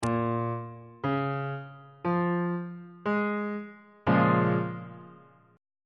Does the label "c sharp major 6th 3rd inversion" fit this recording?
Yes